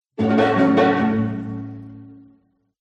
MUSICAL ORCHESTRA Jingle Strings Pizzicato Friendly; smartsound